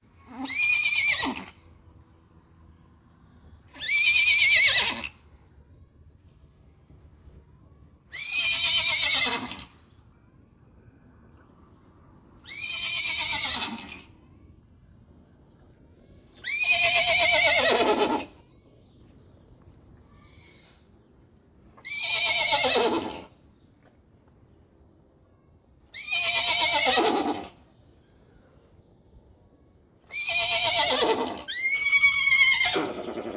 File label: horse